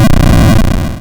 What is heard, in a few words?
Hard PCM Chip; chiptune